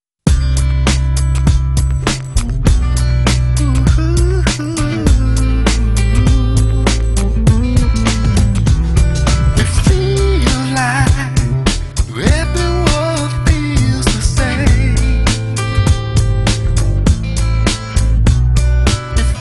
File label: Impulse